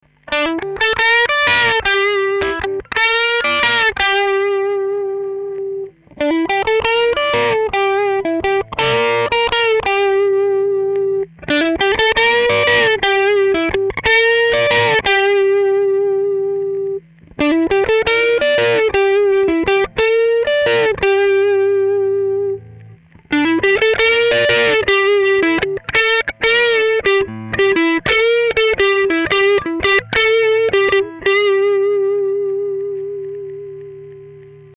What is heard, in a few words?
crunch